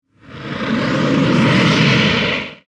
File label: Deep Slow Crunch; Deep Tones; BlastWave